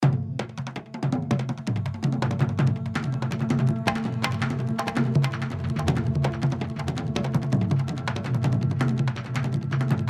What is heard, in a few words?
rhythm; loopzone2